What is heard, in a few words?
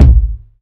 subtle reverb kickdrum g sharp key